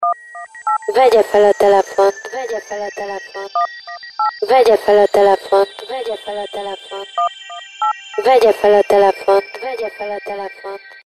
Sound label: Ringtone; nokia